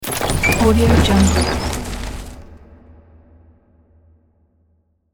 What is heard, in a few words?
magic door open